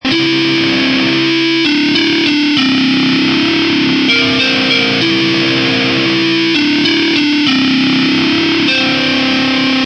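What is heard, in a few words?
Electric Guitar Melody Em 98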